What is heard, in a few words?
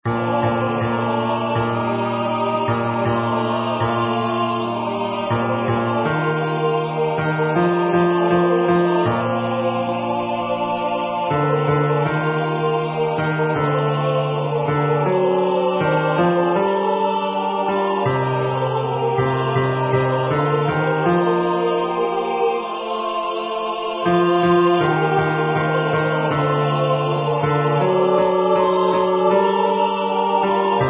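An Irish Blessing Bass, bass part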